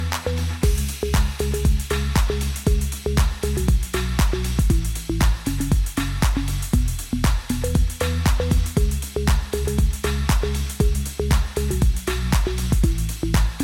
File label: Bells Flute Mix